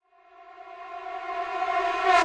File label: backwards trombone space; Various Strange Sounds and Effects